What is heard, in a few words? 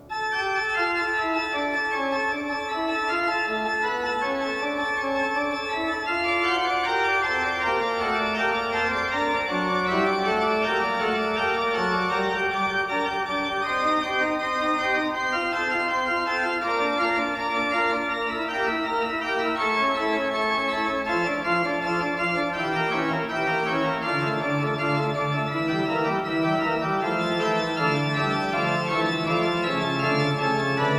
Fugue in D minor, Bach Great Organ Works; Johann Sebastian Bach; Toccata Fugue in D minor, BWV565